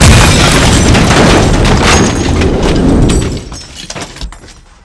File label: explode